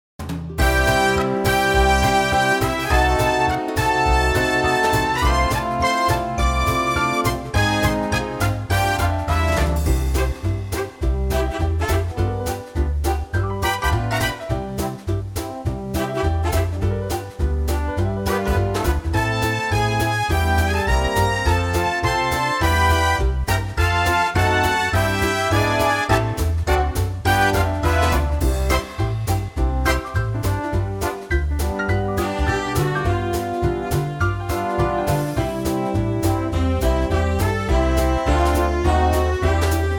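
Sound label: C, backing track; key - C - vocal range - G to C; wartime hit in a big band arrangement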